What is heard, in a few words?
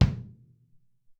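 Room Kick 4, Alesis SR-18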